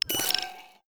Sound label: puzzle map change